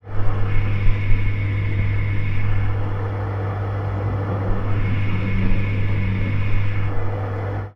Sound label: Washing Machine, contact mic, mechanical; musicradar; contact mic madness; Raw